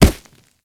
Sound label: Kubrow Run Dirt; Warframe